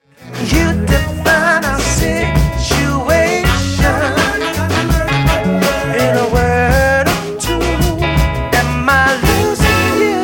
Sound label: vocal